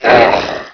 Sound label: DEATH; Quake 1; HKNIGHT